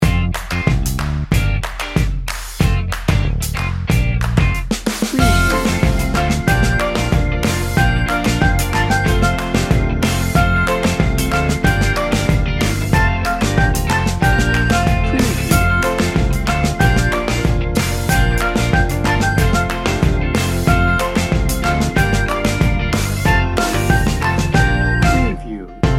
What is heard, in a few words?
reggae pop